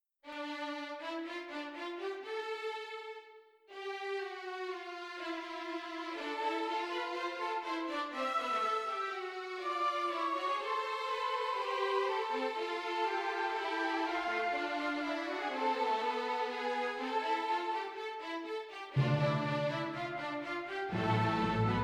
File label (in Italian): Orchestrazione; Ronge; Gerd Prengel